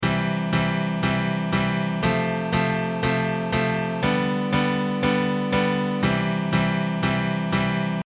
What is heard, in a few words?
C F G C